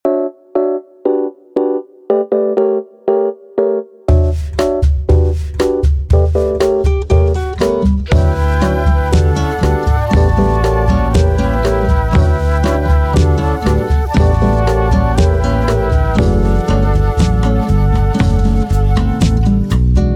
lofi bossa

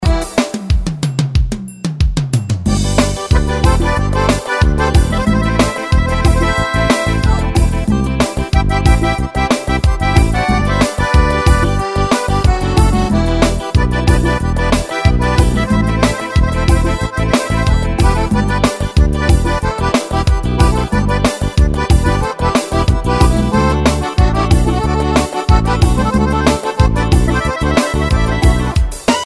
xote

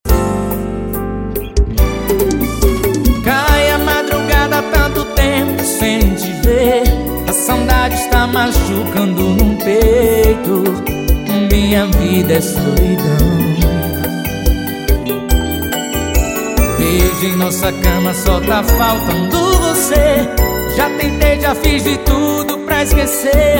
arrocha

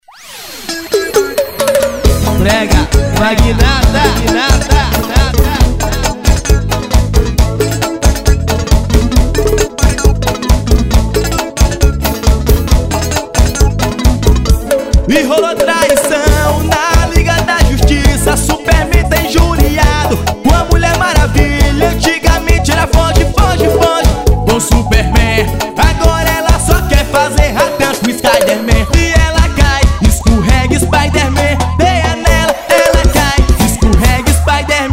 brega

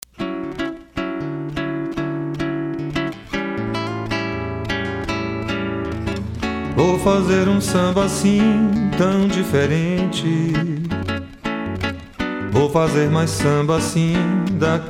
samba

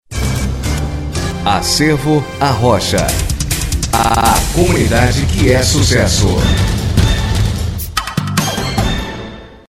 introdução arrocha; acervo arrocha

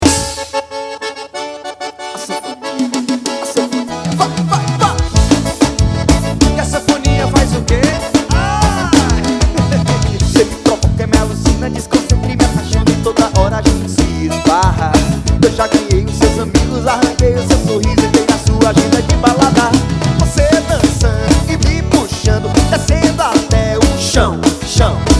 carnaval; carnaval 2013

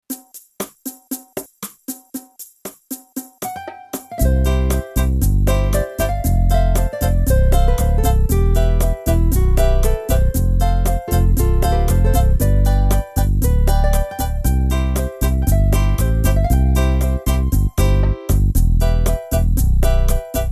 ritmos yamaha choro

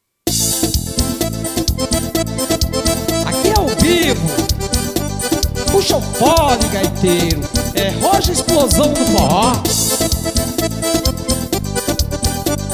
batidinha, forró

